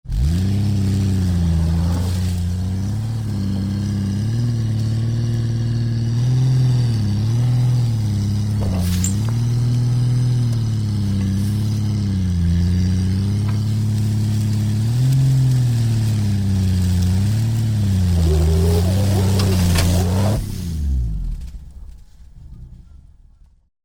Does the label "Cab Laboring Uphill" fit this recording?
Yes